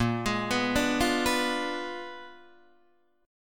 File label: A sharp 7th Flat 9th, Guitar Open D chord; down